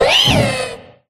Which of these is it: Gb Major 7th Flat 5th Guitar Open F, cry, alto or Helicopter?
cry